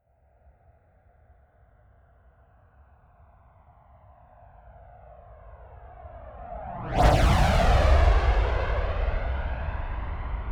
military jet flanker-c turbine flyby right to left close